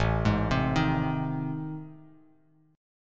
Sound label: A Minor Major 7th Flat 5th, Bass Standard; arpeggiated; AmM7b5; JGuitar